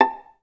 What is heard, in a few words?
VAS PIZ A4, Advanced Orchestra; Best Service; Peter Siedlaczek's Advanced Orchestra